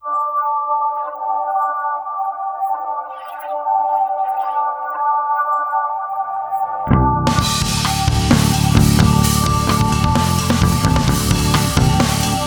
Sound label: Rock menu track